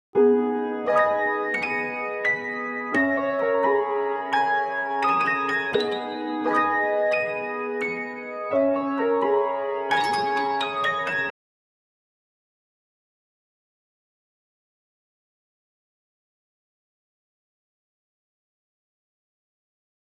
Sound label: 86 BPM G# Min High Piano; Cymatics; 2022 Melody Collection; Mafioso